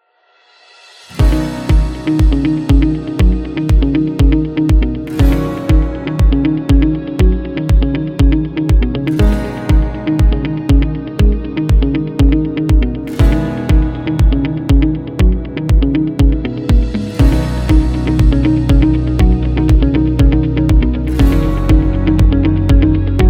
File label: Corporate; corporate interview